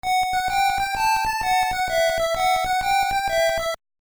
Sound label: Melody; Mother Earthbound Zero; nes; Melody 6 (Dragon)